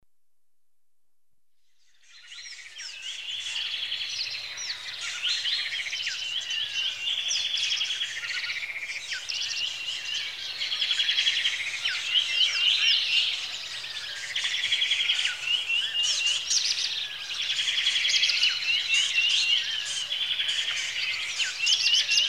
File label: Lakeside with birds early morning; The BBC Sound Effects Library; Africa- The Natural World